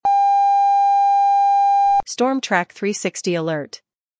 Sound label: Alert; StormTrack360